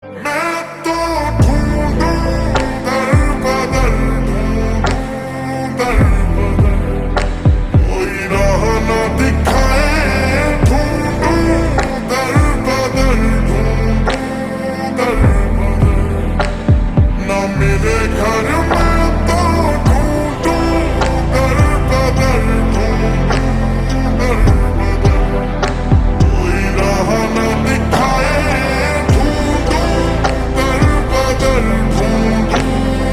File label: Ringtone slowed reverb